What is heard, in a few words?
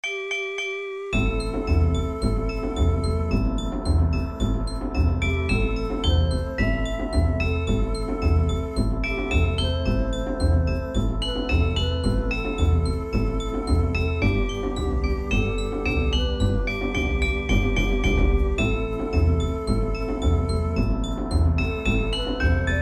Orch